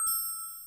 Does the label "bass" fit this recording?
No